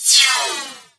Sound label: fx scifi; Ueberschall; Techno Trance Essentials